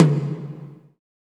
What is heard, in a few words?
tom, Kawai ACR-20